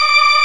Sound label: Organ, MortStrg+WurlVox